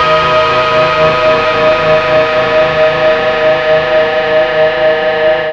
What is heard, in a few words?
Vib Line Dist Tone